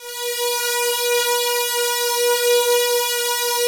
APAD, ATMO PADS; Best Service; XX Large

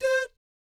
DOOT C5, Jazz; Spectrasonics; Vocal Planet Vol.3 Jazz Fx

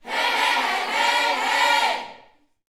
Vocal Gospel PHRASE

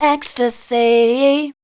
voice spectral, ly short

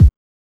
BD; E-Lab; X Static Goldmine 1

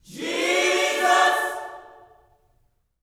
JESUS CHD, Vocal Gospel; Spectrasonics; Vocal Planet Vol.1 Gospel; akai

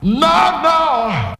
VOC

